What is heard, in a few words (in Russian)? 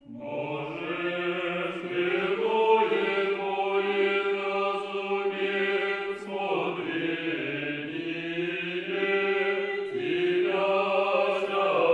5 глас ирмос 4 песни; МСДУ